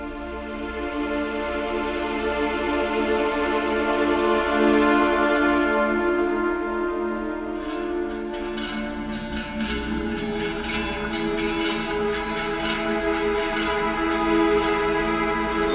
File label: dialog mysterious